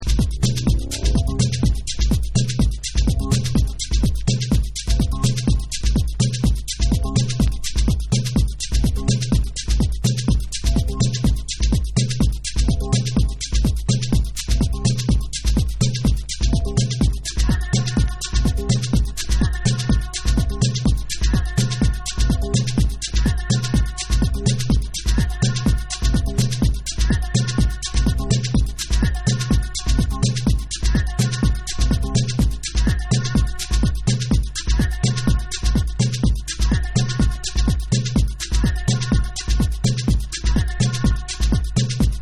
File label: TRIBAL BEATS